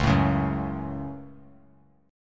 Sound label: C sharp Suspended 4th Sharp 5th C sharp down, chord; strummed; JGuitar